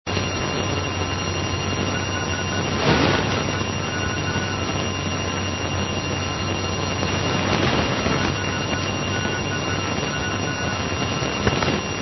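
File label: non-directional beacon; HDM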